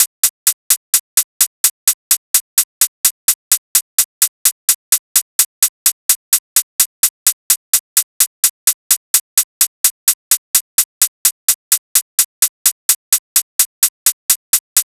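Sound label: closed hi-hat, Drum Loop 128BPM; VEC5 Drumloops; VEC5 Multi Loops 128BPM; Loop Elements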